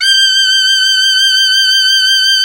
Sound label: mizmar